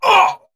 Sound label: Pain; Warframe; Dax Ashigaru; Battle Barks